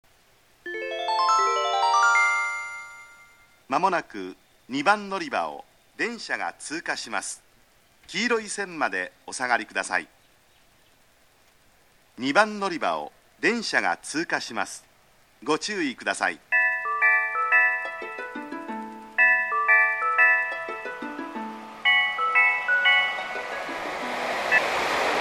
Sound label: track2, eki; nishinihon